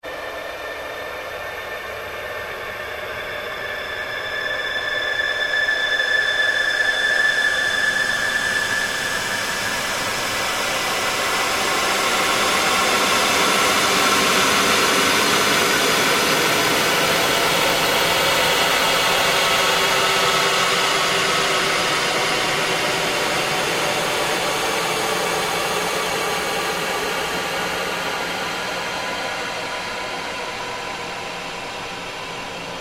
Ambient Sweep Very Slow, Drone; Sound effects created by manipulating other sounds; Drones (Sound Effects:Sound Design)

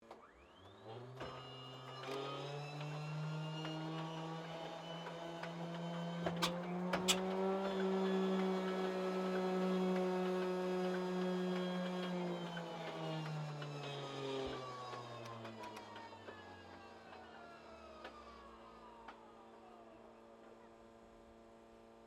AIRCRAFT CA-13 BOOMERANG MISS START; Airplanes; Vehicles; Airplane Sound Effects

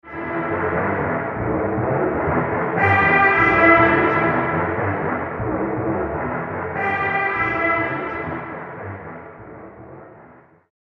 Impending Doom Music, horror sound effect; Musical Sound Effects

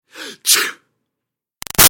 Human Male Sneeze; Sneezing; Sound Effects:Human; Big Room Sound

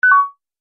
Multimedia Event Notification, beep sound effect

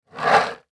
Slide Movement Multimedia; Slides; Objects; Blastwave

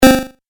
8 Bit Style Blip; Video Game Sound Effects

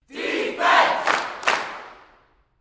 Sports Crowd Chant Defense Single With Clap; Crowd Sound Effects

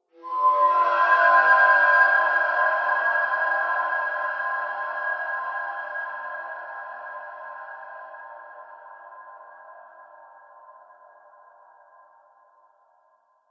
Psycho Suspense Transition

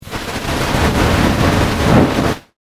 Rocket Booster, Scifi, Descend, Off, Burst, Flame; Science, Fiction; Spacecraft Sound Effects; SoundEffects-BlastWave

